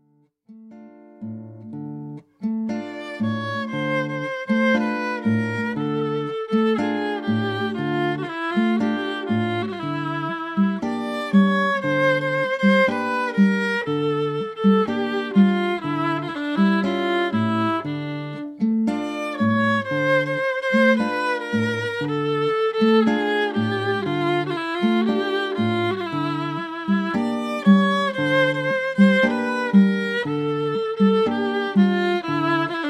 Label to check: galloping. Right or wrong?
Wrong